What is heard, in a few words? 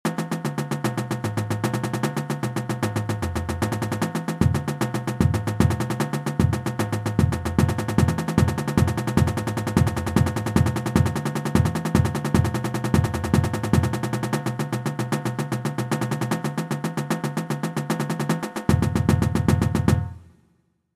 West Coast Diddles, warm ups